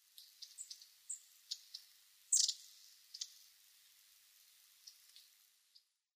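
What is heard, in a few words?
enaga, long-tailed tit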